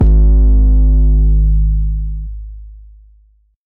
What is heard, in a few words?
808 G#; Cymatics; Astro Gazing